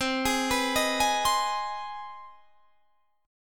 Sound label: C Minor Major 7th Sharp 5th, chord; arpeggiated; CmM7#5; 20,23,21,20,21,20